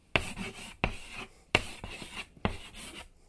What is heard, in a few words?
chalkboard writing